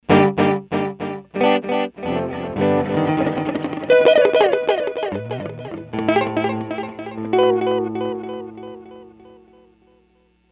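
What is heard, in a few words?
Natural Delay; Sony HR-GP5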